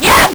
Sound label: LSDj, Nintendo Gameboy; 300 Drum Machines